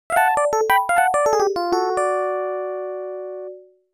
Bell; SKY IM-S610K

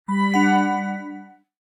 Cloud, notifications

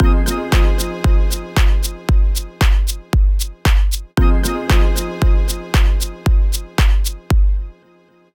Frisky Bouncy Bendy; Sony; Xperia Movie Creator Music

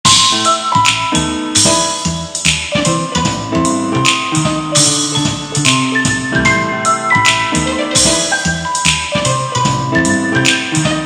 Cat Walking, Ringtones Witty; SKY; IM-U680L

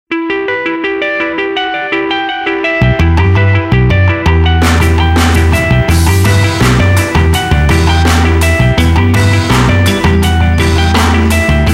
Nokia Tones; Windows Phone apps

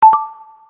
Sd Insert; Palm Treo 800W

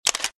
Shutter Sound, Camera; LG KM555e Clubby

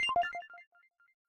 audio end; Samsung Galaxy S10; SmartSwitch

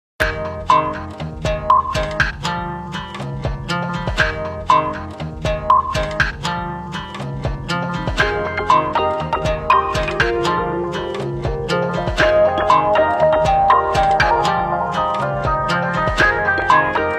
Latin daze; Nokia N8 Prototype

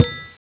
menu enter; Bounce Touch

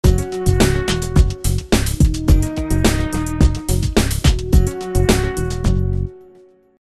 Train, phonetones Sony Ericsson K300i

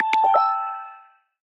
Stone Skimming Day; Xiaomi Redmi-8A

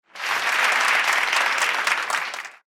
roulette

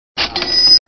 Score Added; Kyocera; KX2-Koi

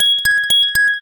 Noisey Ding; Android; Google; Android Open Source Project; newwavelabs

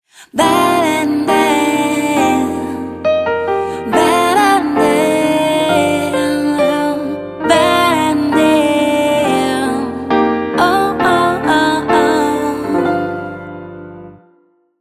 The Mermaid, alarms; Meizu MX4

